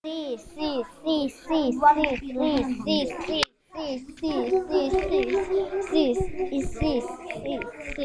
sea

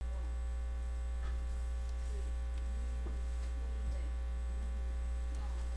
door

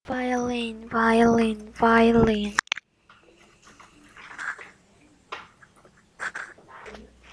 violin

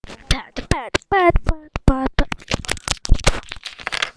pad

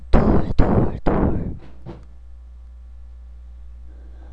door

